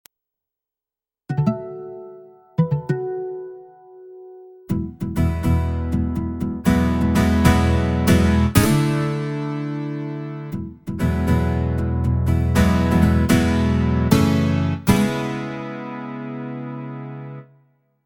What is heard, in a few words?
Strum